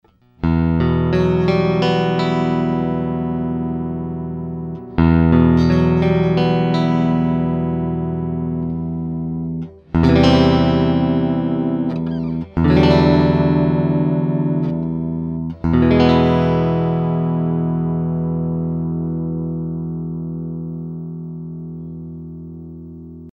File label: new strings